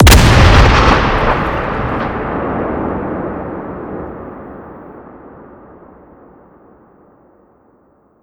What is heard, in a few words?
explode